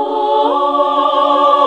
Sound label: SING, CLASSIC VOX1; Best Service; Hallelujah